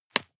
juggle catch hard

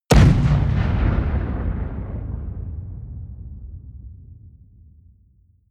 bomb fragmentation distant

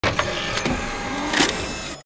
electric door

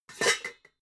pots clank soft

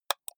computer mouse left click single hard

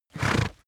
horse blow short

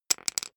poker chip fall wobble short

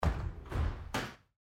cable car door

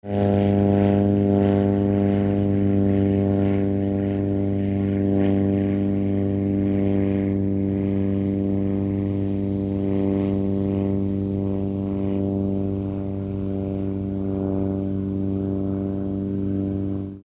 airplane propeller engine

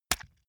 leather impact mud hard squelch

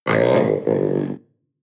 panther young growl deep short

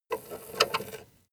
treasure handle squeak

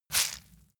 leather impact mud medium squeeze

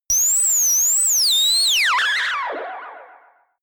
ululation ufo

